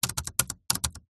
technology computer keyboard small bluetooth typing short key presses